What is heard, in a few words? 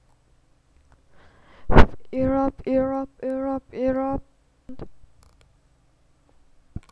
erupt